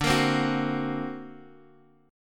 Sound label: D Augmented 7th, Guitar, Open D